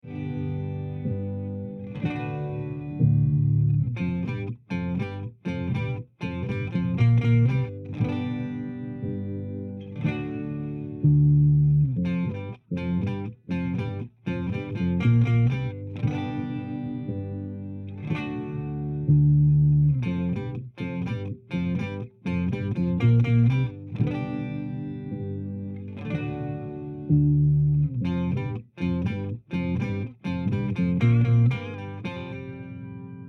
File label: tc electronic sub n up octaver bass clean